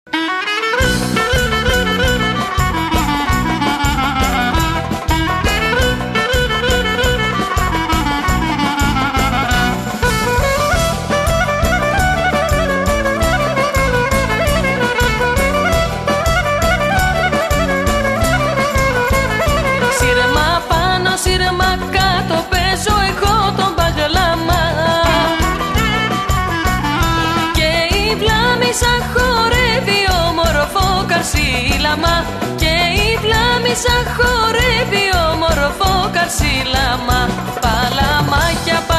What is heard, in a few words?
Greek Dance Track